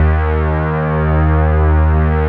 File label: P5 STRINGS C; Greytsounds; Scott Peers Sound Engineering